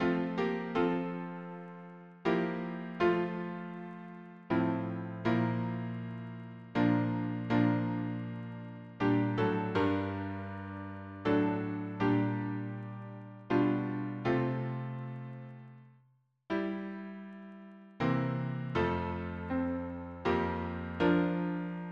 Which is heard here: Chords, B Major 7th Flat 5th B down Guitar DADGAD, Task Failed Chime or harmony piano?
harmony piano